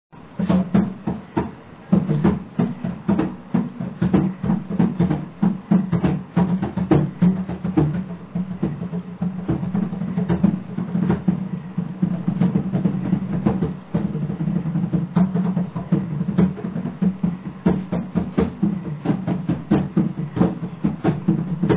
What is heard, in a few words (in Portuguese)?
percurssao